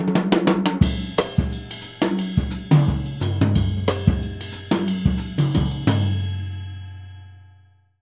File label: Jazzistic; Univers Sons